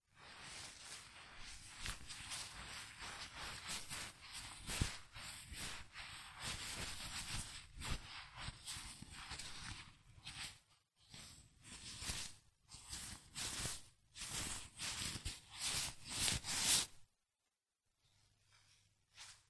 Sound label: rub the umbrella; operation